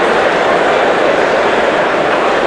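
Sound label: crowd ambient; TrickStyle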